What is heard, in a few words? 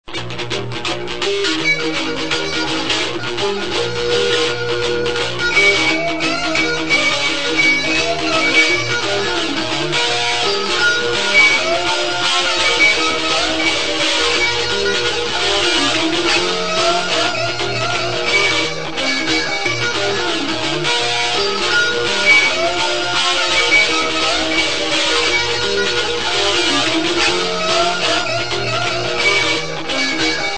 Shuffle